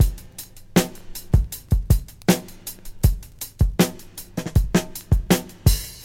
106 bpm hq drum groove f sharp key, kick tuned to the F# note